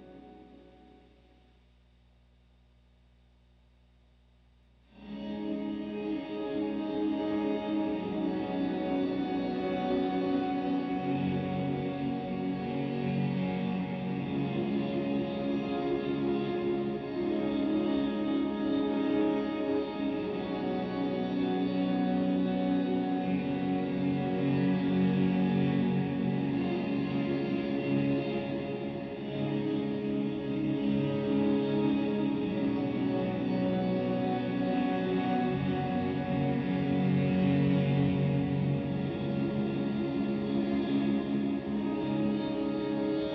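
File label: square drone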